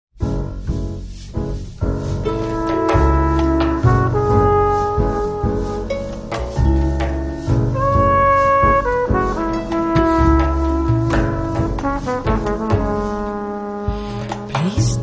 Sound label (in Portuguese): harpa bossa